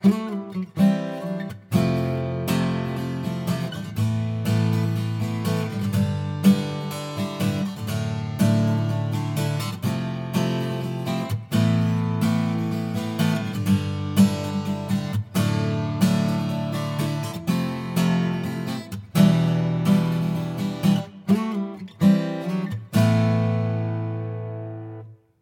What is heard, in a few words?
Strumming Processed; se electronics vr1